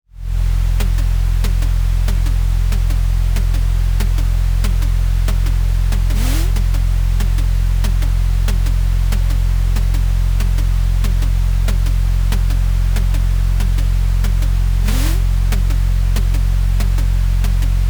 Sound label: amb train inside low